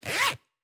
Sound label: Zip Opening Short; InventorySFX